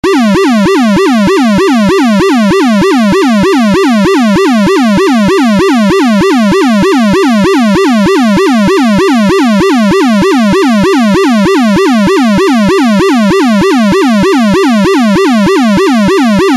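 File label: Siren Tone YELP (RUMBLER)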